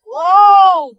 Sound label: soul essence soft wailing, vocals